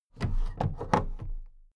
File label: big plastic case put down